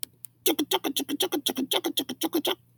Music Musical instrument Percussion Wood block, vocal imitation